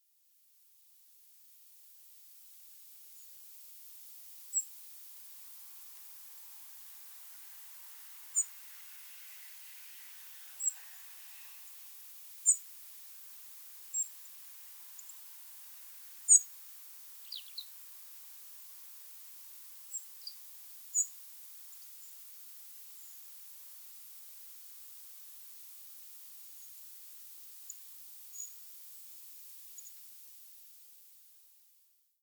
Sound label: Erithacus rubecula; SETE